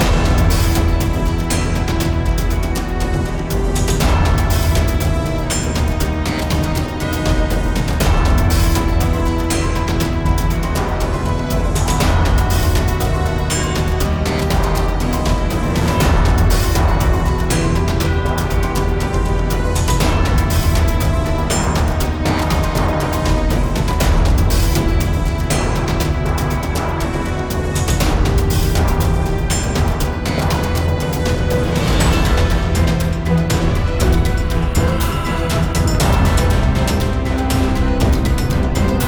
Battle

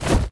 Spider Jump; Hollow Knight; Mimic Spider Jump

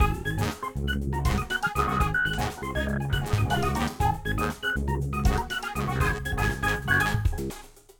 Impossible-Triangle Music Loop

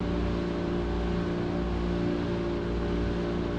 Intro Vf Pod Open Base Loop

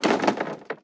Medieval Tower; Rabbids Multiverse

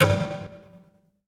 Impossible-Triangle Pizz

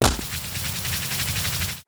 Bee Soldier Drill Prepare

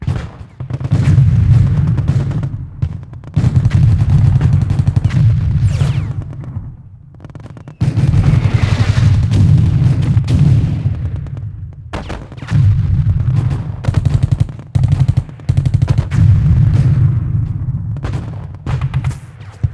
Amb Warzone; Fur Fighters

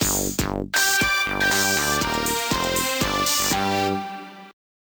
Transition

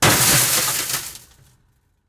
Impact, metal, stove, glass Shatter; Frogsong

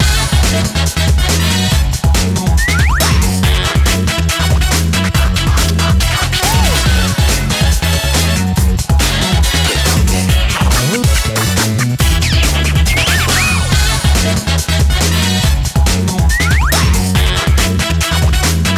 Bgm Soundcheck

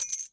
Coin Bag Ring Gemstone Item; Legend of Keepers (gamerip) (2019)

